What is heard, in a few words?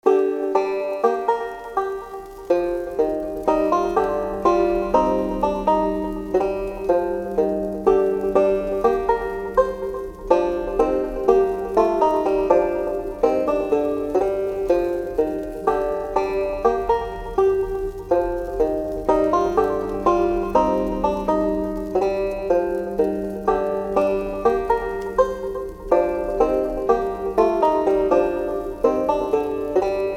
plays banjo around a campfire in a cave; comet